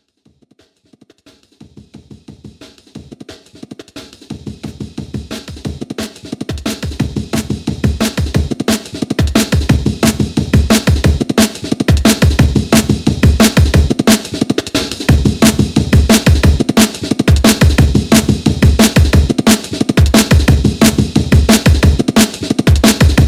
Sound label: Chorus tambourine; Martin Sehnal